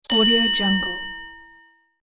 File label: school bell